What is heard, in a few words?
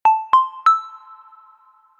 Melodious, notifications; Itel itelOS 13